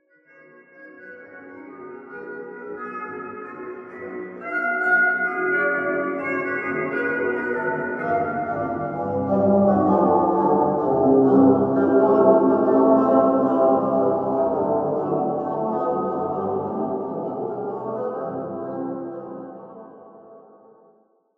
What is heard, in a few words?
Jazz